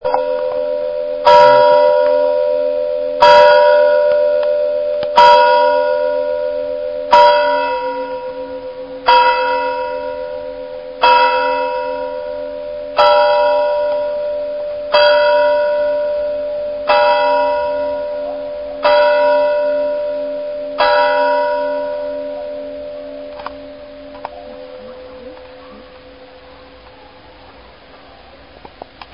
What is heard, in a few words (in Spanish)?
Reloj